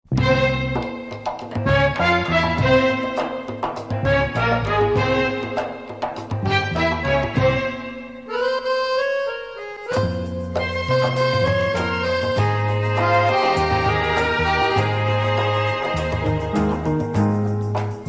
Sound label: Instrumental